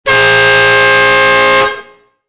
car horn sound effect

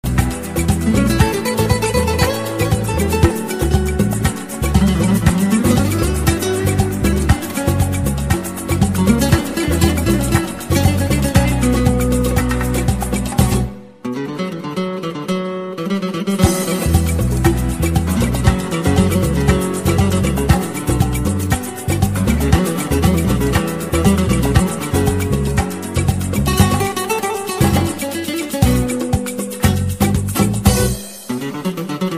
best spanish guitar

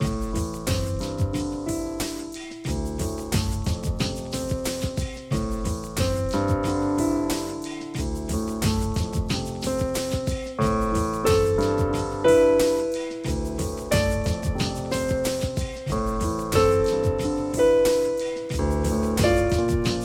The Best Relaxing Romantic Love ringtones

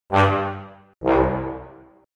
fail trombone

